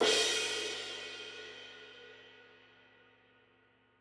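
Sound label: CRASH HIGH CRASH HIGH CYMBAL, analog recorded drum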